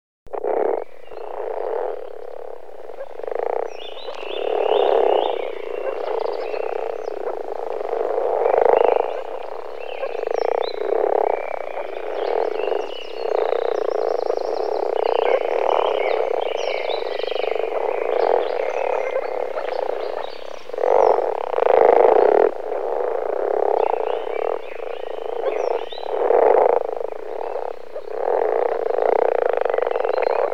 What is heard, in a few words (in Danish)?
butsnudet frø